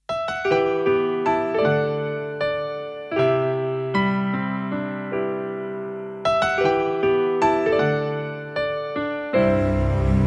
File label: off vocal